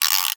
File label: Alarm Clock Wind; Clocks, Watches and Timers; Blastwave